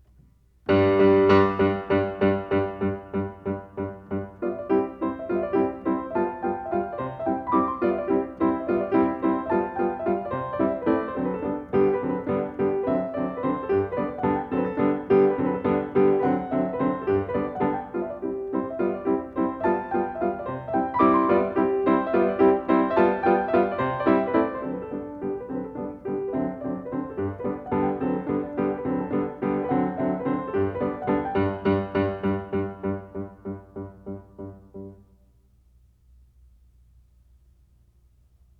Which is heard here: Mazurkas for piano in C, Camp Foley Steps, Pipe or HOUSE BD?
Mazurkas for piano in C